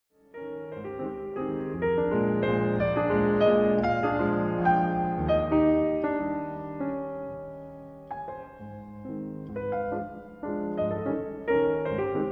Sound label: for piano Valse allemande; Carnaval; Op. 9